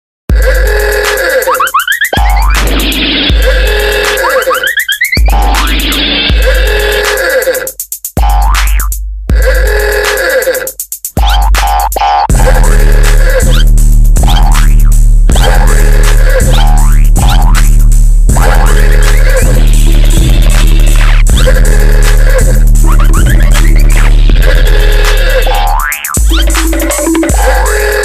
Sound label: Goofy Type Beat Funny Sound